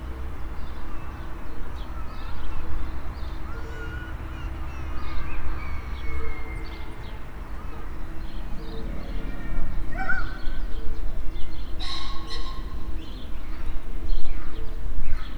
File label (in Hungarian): tavolrol halkunka, facan; állathangok; nyíregyházi zoo 2010